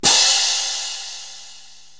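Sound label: Orchestral Cymbal Open; Korg TR-Rack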